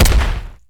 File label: machete fire